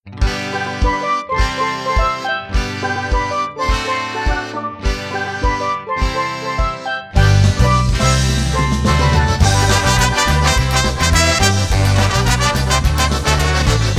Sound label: Booby Trap Polka